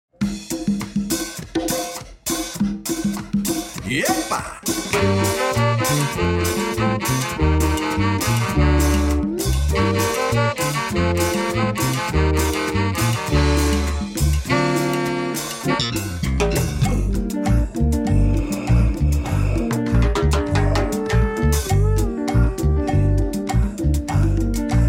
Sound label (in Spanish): Cumbia Cavernicola